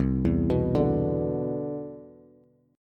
C Major 9th, Bass; arpeggiated

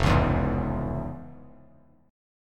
F Minor Major 7th Sharp 5th, F, Bass Standard; FmM7#5 strummed; 1,4,2,1

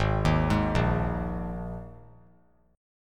G sharp Suspended 2nd, G sharp, down; G#sus2; arpeggiated; JGuitar

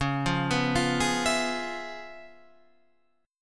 Db 7th Sharp 9th Db down Guitar Open F; Db7#9 arpeggiated; JGuitar

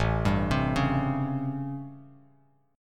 A 7th Sharp 9th, Bass Standard; A7#9; arpeggiated; JGuitar

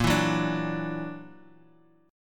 F sharp Augmented 7th, A sharp

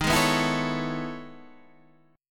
E Major 13th chord

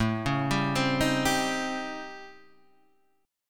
A 7th Sharp 9th Flat 5th, Guitar, DADGAD

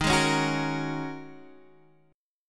G sharp 7th Suspended 2nd Suspended 4th D sharp

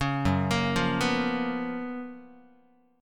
Gb Major 11th, down, Guitar; GbM11 arpeggiated; 4,2,1,3,3,x; 4A,4E,5A,5D,5G#,6C#

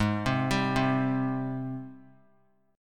G sharp Major 7th G sharp; 20,19,22,22; JGuitar

